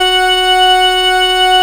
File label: SIEL, String Machines